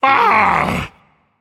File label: ouch; smite; thor